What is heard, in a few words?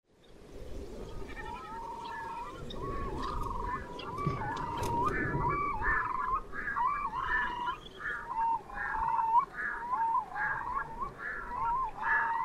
red throated diver